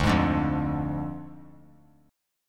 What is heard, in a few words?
D Diminished D; strummed